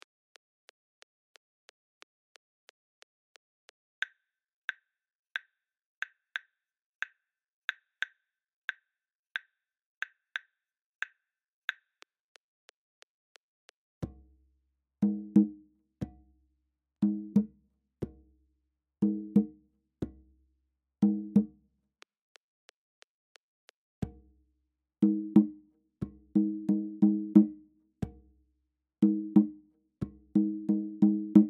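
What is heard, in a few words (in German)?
Kakilambe 90bpm, Afrikanische Rhythmen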